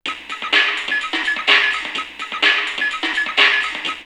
High & Pass 126, drumloops, Rave; X-Static Goldmine; 90 s Sample CDs

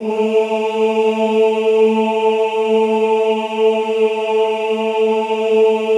OOH A2, Classical Choir; Best Service; Extended Classical Choir; OOH MIX

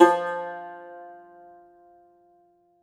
cumbus saz F, plucked strings; E-MU Producer Series Vol. 7 – Old World Instruments

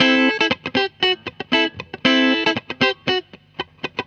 GTR; USB Soundscan vol.32; Roots Disco; AKAI

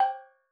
Steel Drums Layer G5, Calypso Steel Drum; Percussion

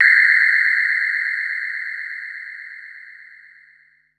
Noise Mid, Korg Wavestation-SR Drum Kit

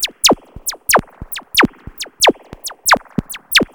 PULSING; Zero-G Ambient 1

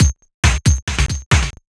Tech Funk, Synchro Funk, Beats; Sonic Foundry (Sony Creative Software)

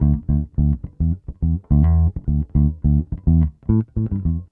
Garage Funk Bass; Sonic Foundry (Sony Creative Software); What it Is!

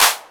JD800 Dance Card Drums

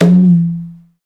PRC XCONGA, Skin Drums

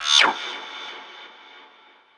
SFX, Drum & Bass, 160 BPM

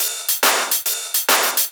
Shuffled Loops, 140 BPM

